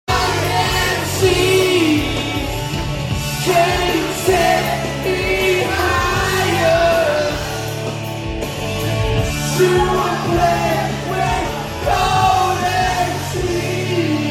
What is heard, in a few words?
Karaoke